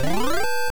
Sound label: Item Taken, MSX PSG; pippols